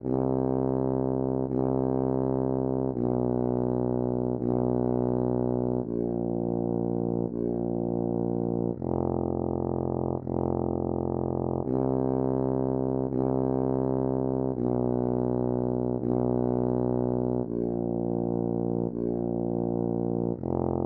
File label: tuba